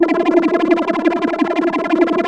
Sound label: Korg PS3100 E 5; Back In Time Records; Korg Universe VOL-1